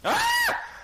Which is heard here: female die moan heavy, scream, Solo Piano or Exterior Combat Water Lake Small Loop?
scream